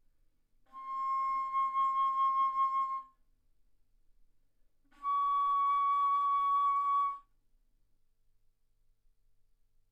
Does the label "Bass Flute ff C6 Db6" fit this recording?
Yes